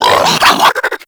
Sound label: Infested Biped Flip; Warframe